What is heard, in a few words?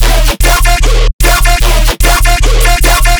bass loop 150 D#; Virtual Riot